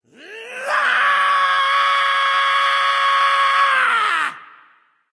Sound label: battle cry; smite; ahmuzencab